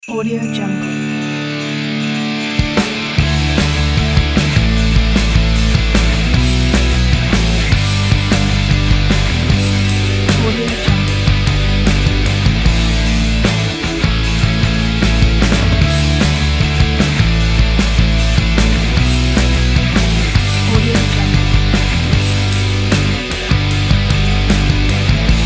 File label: christmas rock